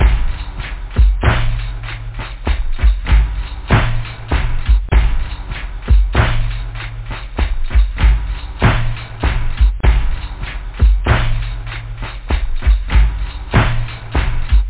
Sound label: style, loop, techno; da capo vol1; instruments